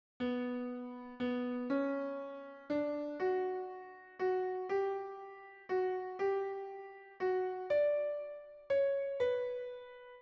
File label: Music